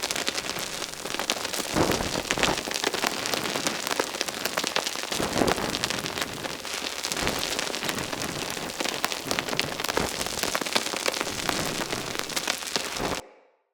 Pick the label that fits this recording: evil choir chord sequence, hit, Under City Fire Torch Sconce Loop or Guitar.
Under City Fire Torch Sconce Loop